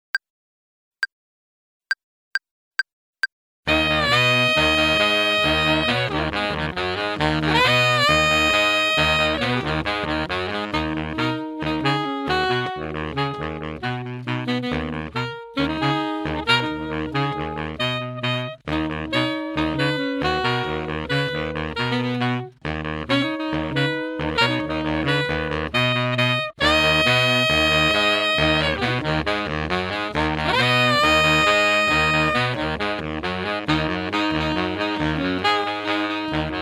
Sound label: playalong tenor